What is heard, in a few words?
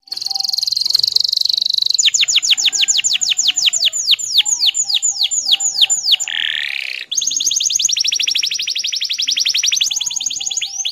Canary, Bird Songs